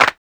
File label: clap single shot f key; percussion; single hits one shots